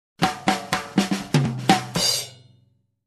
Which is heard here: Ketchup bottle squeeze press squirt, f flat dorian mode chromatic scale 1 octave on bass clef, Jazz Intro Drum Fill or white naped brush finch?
Jazz Intro Drum Fill